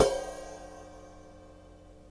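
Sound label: crash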